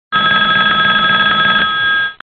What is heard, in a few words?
Old Ringer; V710 Ringer